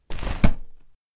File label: umbrella